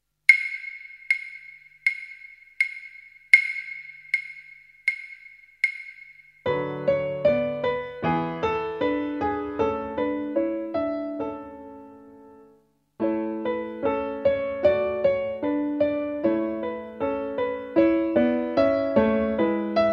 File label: Backing, Violín; On A High Wire